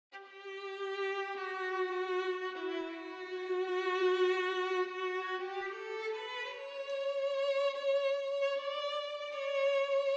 VIOLIN PLUG IN OFF; Kontakt third party 3 demo song

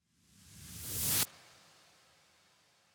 KONTAKT LIBRARY SONG IMAGER OFF

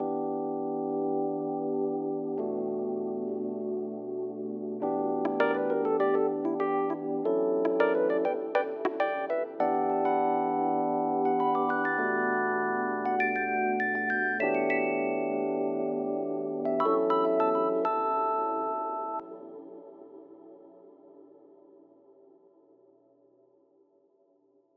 FUNK GROOVE E PIANO FULL

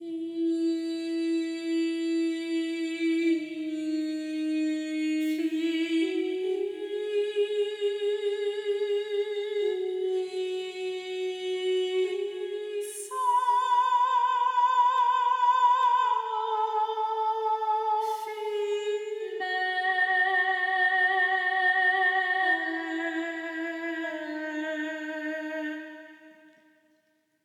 VOCAL KEY SWITCH; KONTAKT THIRD PARTY DEMO SONG

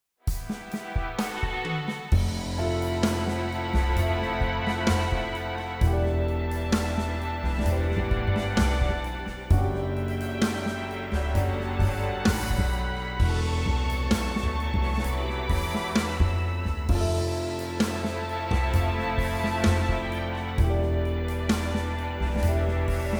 STRINGS ARTICULATION ON

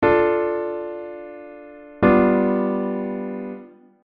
Dm G7

